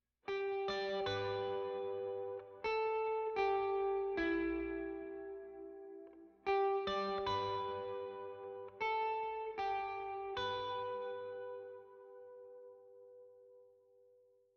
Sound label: Combat Area B2 Threat3 155 Em